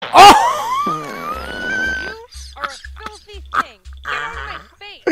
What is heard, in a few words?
Wheezy Laugh; Dashie